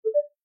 reconnect

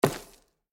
settlement place

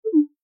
disconnect

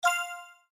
sfx join room

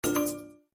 sfx offer accepted

